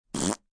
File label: Fart Wet, Mouth effects, fart, short wet one (human voice)